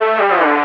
vocal love Gm; SD AURORA Sample Pack; Slate

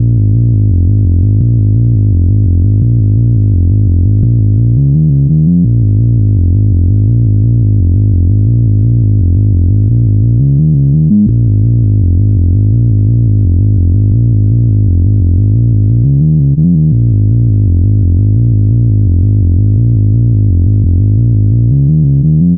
Synth loop 85 Bbm, Synth Bass Loops; Slate Digital BASSHOLE Sample Pack

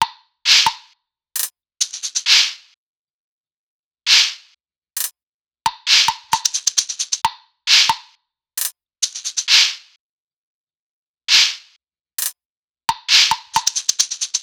perc 133; Drum Sample Pack; Loops; SD SEISMIC; Slate

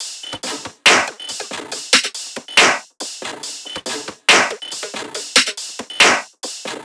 tops 140, Drum Sample Pack Loops Top Loops; SD SEISMIC; Slate

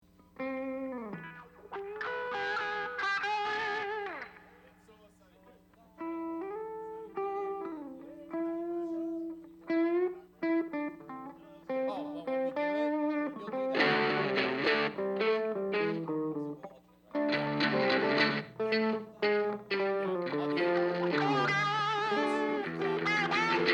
Strings Mix

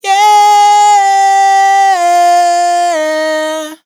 riff Yeah Harmony Fm, Dry Vocals; Raw Vocals; Slate Digital Omnivox Vocal Sample Pack

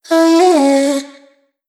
Vocal one shot C#m; Slate Digital Omnivox Vocal Sample Pack

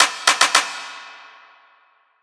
clap fill; Drum One Shots; SD MIDNIGHT Sample Pack; Slate; Claps

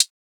hat, drum sample; Hats

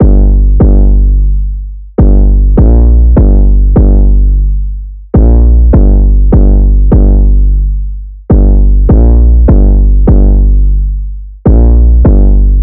808 loop 152 Gm; Slate Digital BASSHOLE Sample Pack

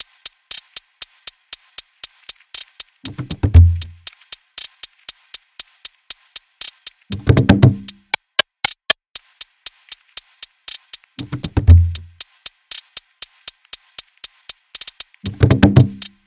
hats 118, Hat Loops; drum sample pack; SEISMIC; Slate

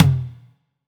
Tom, 909 Toms; Slate; Vintage Drum Machines Volume 3 909 Edition

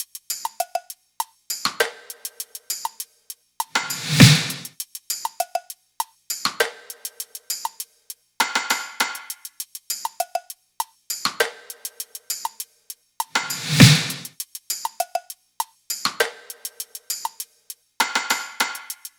top, Drum Loops, Top Loops, 100; SD MIDNIGHT Sample Pack; Slate

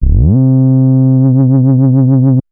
Synth oneshot Glide Up C, Synth Bass; Synth Bass One Shots; Slate Digital BASSHOLE Sample Pack

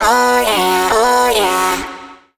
Vocal Sample Pack Phrases B

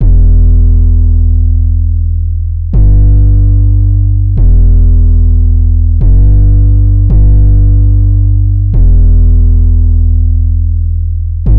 808 loop 110 Em; Slate Digital BASSHOLE Sample Pack; 808 Loops

